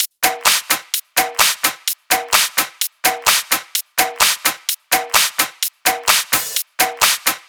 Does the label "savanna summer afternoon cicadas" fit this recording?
No